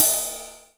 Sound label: RID EDG, Korg EMX-1, Drum Machines